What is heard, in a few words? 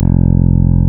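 MAGIC BASS F2; MAGIC BS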